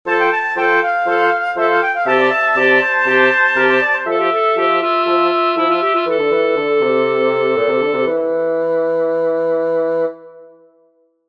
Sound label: Fugato Accompanied